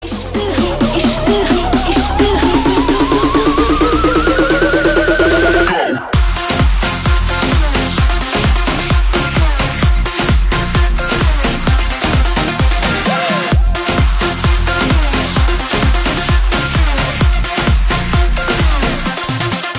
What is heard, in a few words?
bollywood trap, ringtone